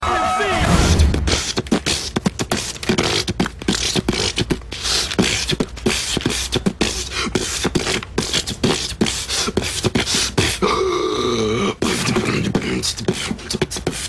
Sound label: beatbox